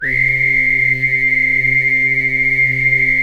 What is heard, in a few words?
WHISTLIZR, HUMAN SYN